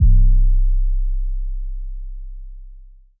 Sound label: kick soft fm bd; Polyend; Recue